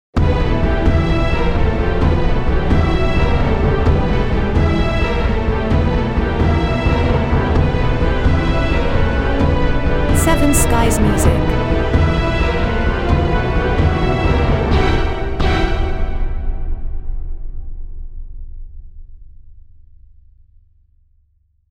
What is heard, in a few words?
Crescendo Stingout